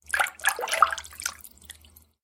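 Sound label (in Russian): вода поток близкий четкий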